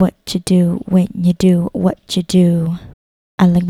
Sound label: Vocal, Infinite Soul